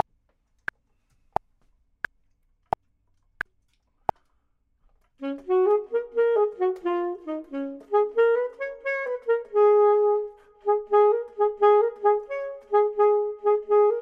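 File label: top, Saxophone and Clarinet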